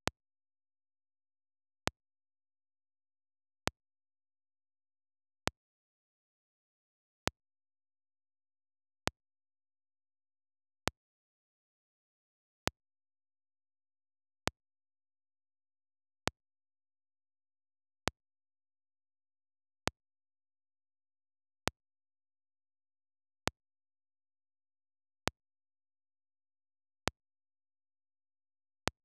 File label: Scratch Noise, Phonograph; Roland BK-5